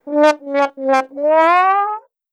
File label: sad Trombone Up